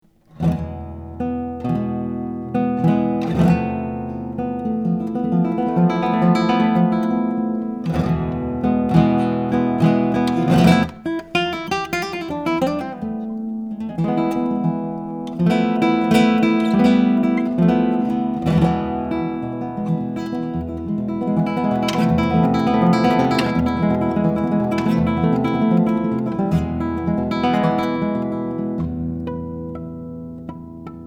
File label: Voice, Cathedral Guitars